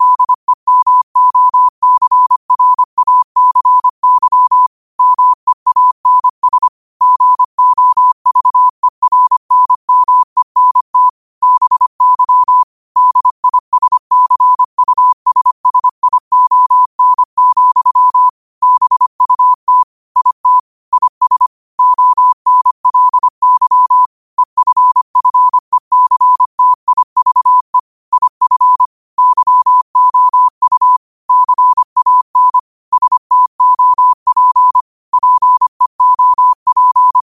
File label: 5 wpm, morse